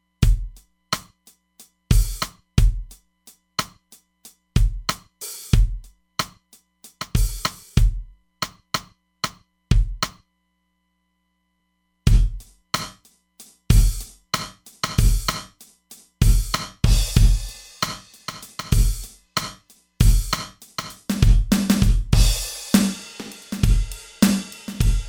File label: Small Bright Room, Roland DEP-5; Factory Patches